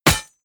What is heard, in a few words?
solid short medium, hits impacts